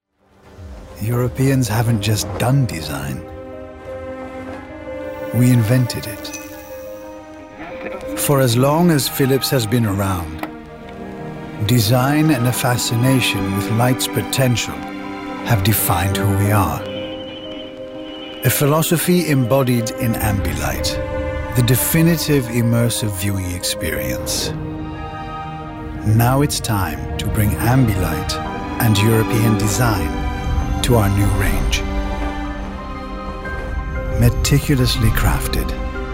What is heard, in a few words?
European sound; Phillips